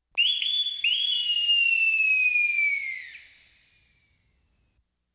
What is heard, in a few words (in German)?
bootsmannspfeife wenden!; Hafen